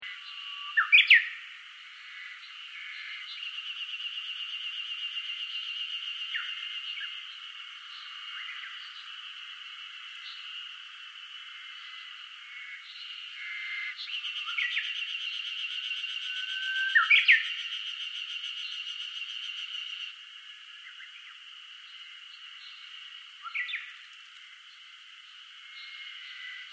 Ambience Osorosan Birds Insects Summer Loop, Osoresan is a dormant volcano; Outdoors (Sound Effects:Backgrounds)